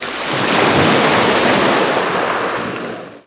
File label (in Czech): Vlny; wob2 demo